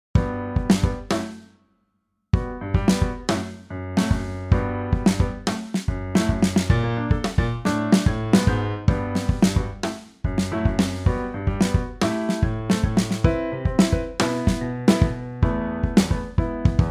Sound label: kick snare